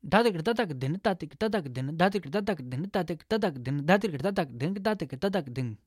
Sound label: Indian Vocals